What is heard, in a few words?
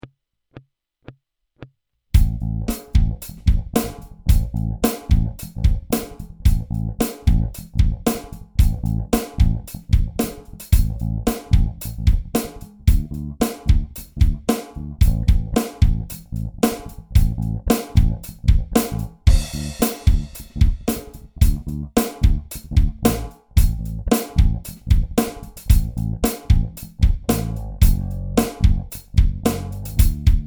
rhythm